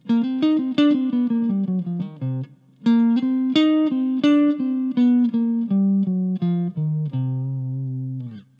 Aeolian Lick, guitar

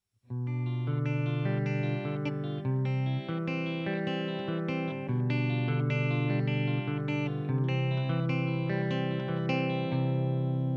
Chicken Pickin; Exercise

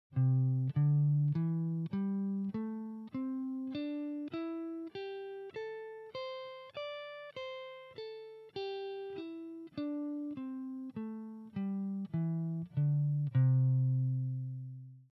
Pentatonic Pattern, guitar

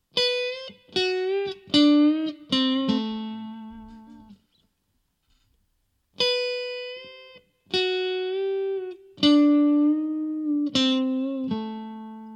1 Note Bend, guitar

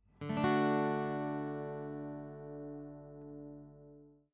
D Chord, guitar

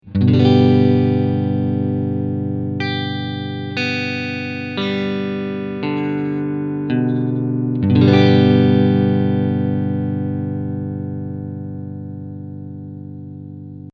G Chord, guitar

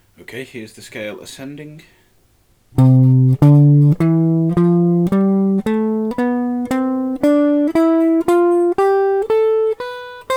c major scale 2 octave closed position

